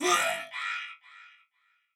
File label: death sound